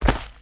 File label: footsteps; smite; god merlin